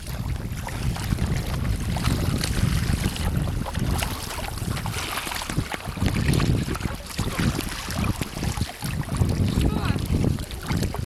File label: kayak; wrangell